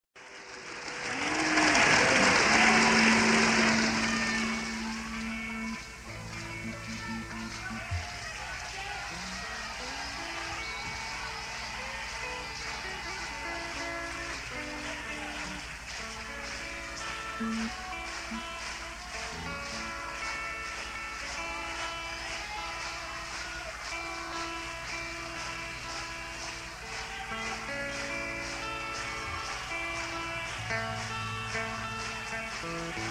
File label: Crowd noise; Odeon Theatre, Liverpool